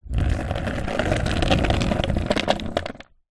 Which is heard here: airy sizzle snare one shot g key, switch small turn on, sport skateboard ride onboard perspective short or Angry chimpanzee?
sport skateboard ride onboard perspective short